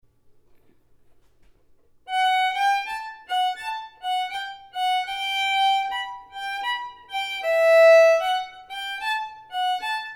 Copeaux des Bois slow, fiddle

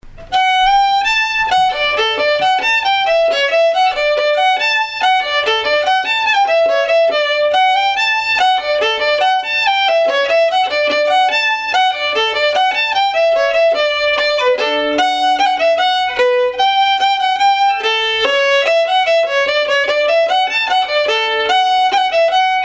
La Ronfleuse Gobeil slow

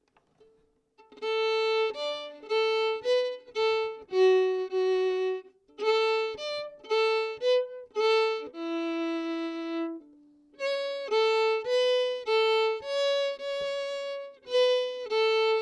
Chinese Breakdown slow, fiddle

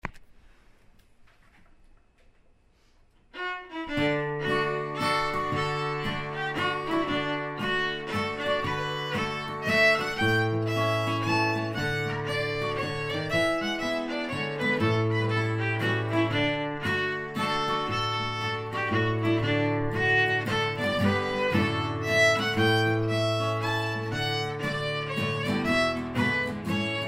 Country Waltz

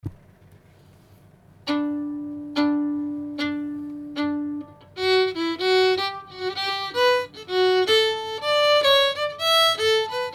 fiddle; Bells Favorite

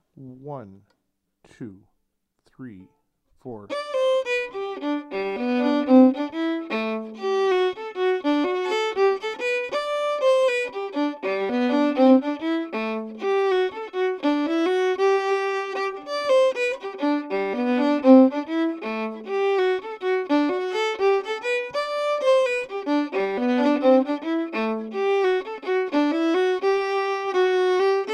Gig Harbor Jig slow

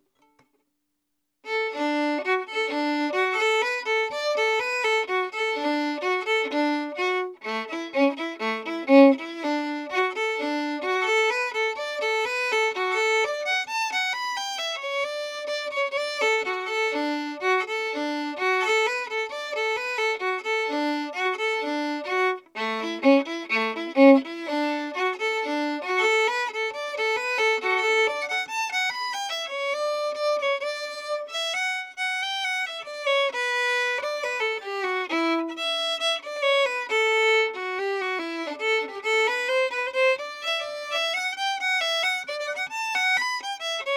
Durangs Hornpipe slow

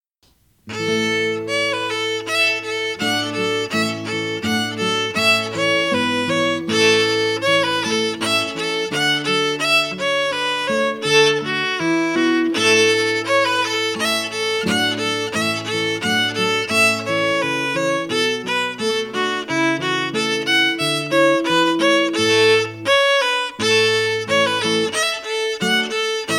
Reconciliation slow; MCDFW, introduced in 2019

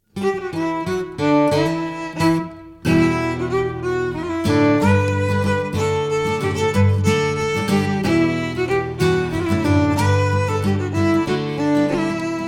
Peat Fire Flame; An intermediate reel in the key of Em played by MCDFW, introduced in 2019.

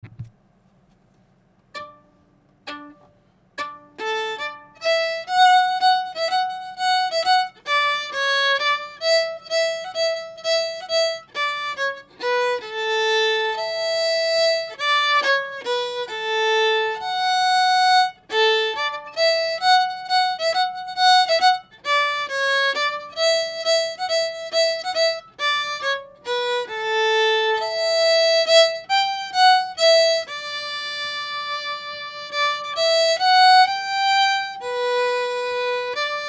Snow Deer

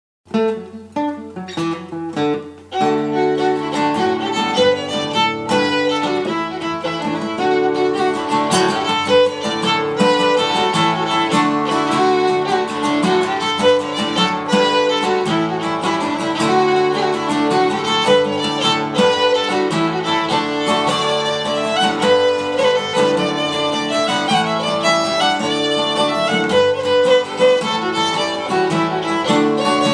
Kerfunken Jig

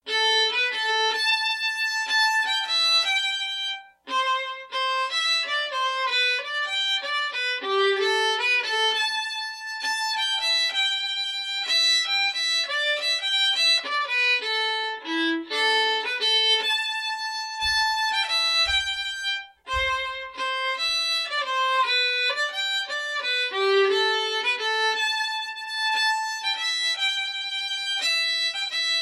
Drummond Castle Jig slow

